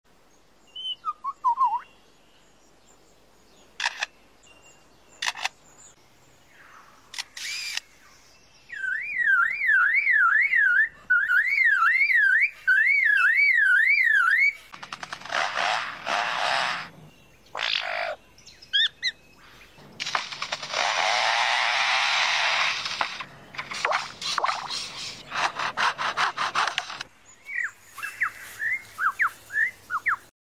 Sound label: Menura, پرنده